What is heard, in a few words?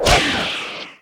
Sword Using; Net Power 2000 #2